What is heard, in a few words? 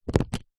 leisure playing cards loose deck set down carpet